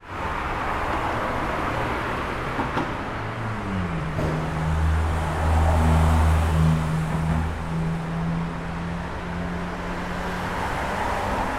transport traffic pass by recorded from inside multi storey car park